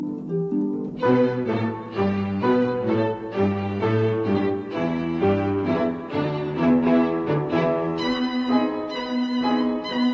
version for harp piano and string quartet Moderately fast; Ennanga; Louis Kaufman